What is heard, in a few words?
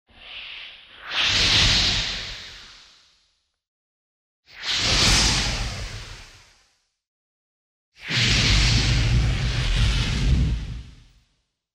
flame flash; fire